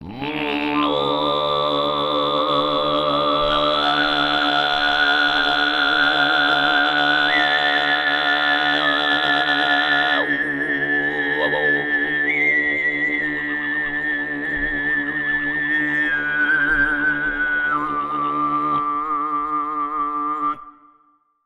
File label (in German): ethno world 5 mongolei; best service